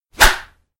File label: scream kill